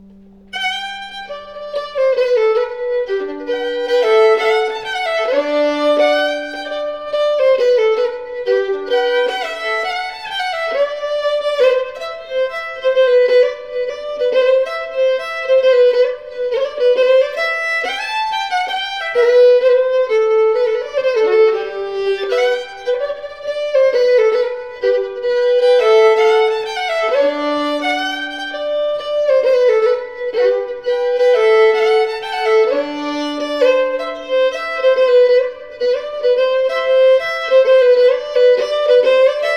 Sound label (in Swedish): Hopp Tussilunta Sarna